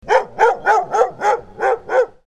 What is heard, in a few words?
alert